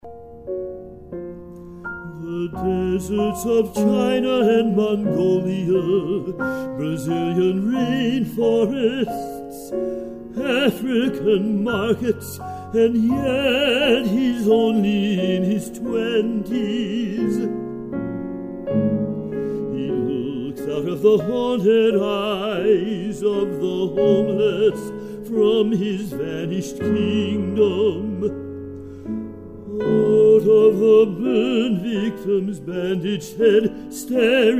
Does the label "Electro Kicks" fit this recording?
No